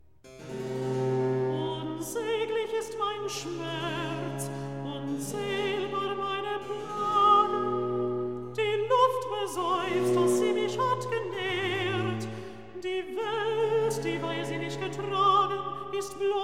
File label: Recitativo; Judas Unsäglich ist mein Schmerz; Klassika; Handel Masterworks